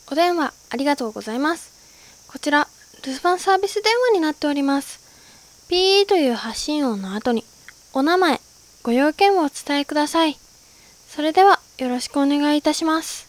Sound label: aaaa